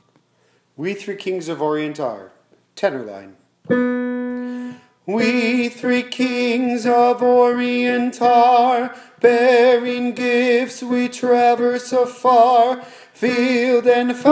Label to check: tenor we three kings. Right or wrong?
Right